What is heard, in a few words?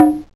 Perc, Hand Drum; Bitkits; Drums